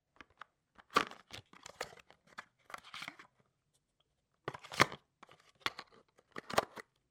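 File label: box opening sound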